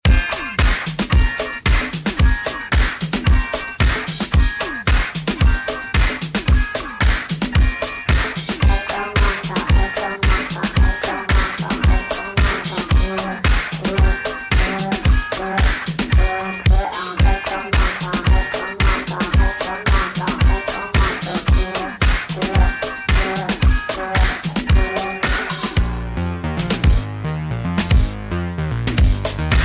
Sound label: Instrumental